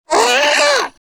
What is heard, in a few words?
cursed mad noise